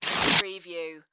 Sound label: Index Card Rip Tear; Sound Effects Bible